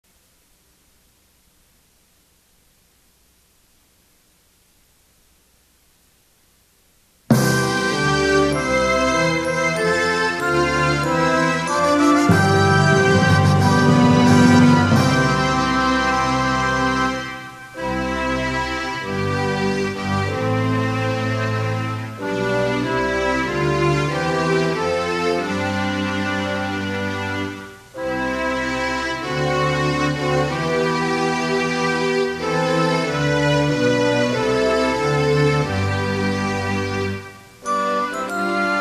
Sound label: O Canada Instrumental